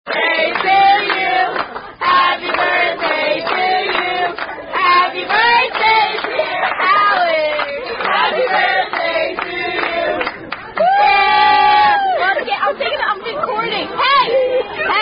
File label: Happy Birthday (Standard) With Wait Staff; Outback Wait Staff